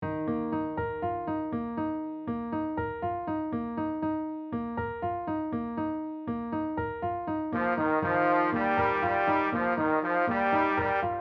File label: piano melody FOLEY